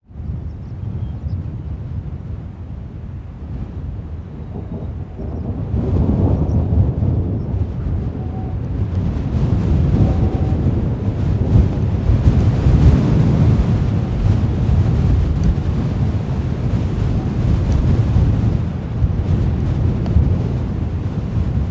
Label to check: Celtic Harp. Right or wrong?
Wrong